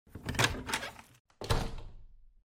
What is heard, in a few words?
mine door